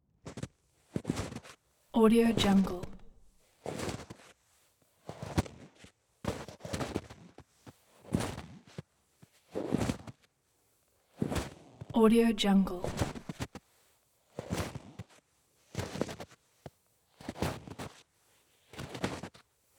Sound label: footsteps heavy boots very cold snow ice layer with powder on; footsteps heavy boots very cold snow ice layer with powder on top